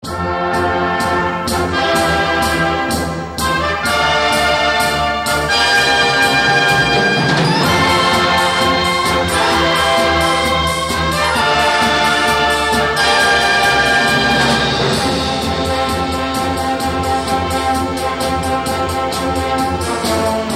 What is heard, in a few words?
Instrumental